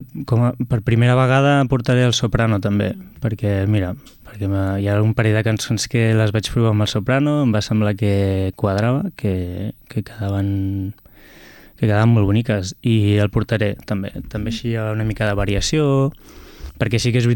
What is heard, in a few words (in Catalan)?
SAXO SOPRANO